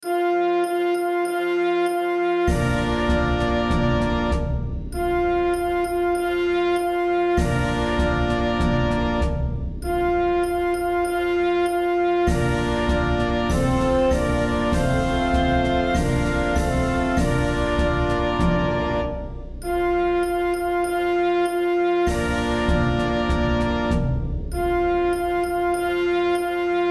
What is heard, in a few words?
Fanfare